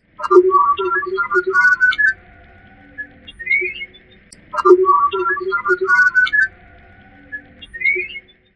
zvuki inoplanetjan

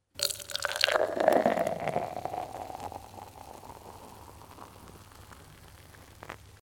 zvuk gazirovki

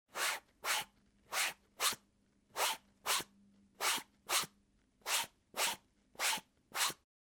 zvuk gubki

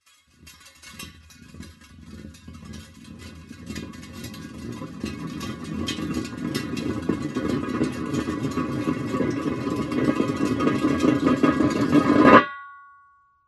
zvuki shinomontazha

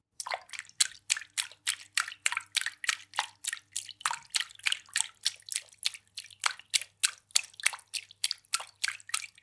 plesk vody zvuk

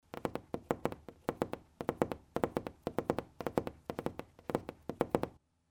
stuk palcev

